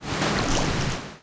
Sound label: Water